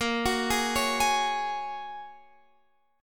Bb Minor 7th Sharp 5th, Guitar, Open F; x,17,18,15,16,15; arpeggiated; chord shape

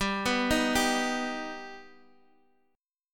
G Augmented G Guitar Half Step Down

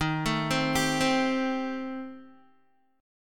Eb 6th Flat 5th, Guitar; Eb6b5 arpeggiated; 10,12,12,12,12,x; JGuitar

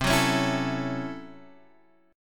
A 6th C, A6/C chord; strummed

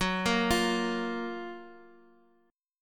F sharp Major 7th, Guitar, Open D

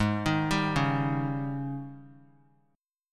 G sharp Major 7th Suspended 4th G sharp; G#M7sus4 arpeggiated; JGuitar

